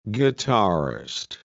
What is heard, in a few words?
Guitarist